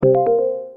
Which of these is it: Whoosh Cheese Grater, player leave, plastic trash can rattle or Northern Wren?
player leave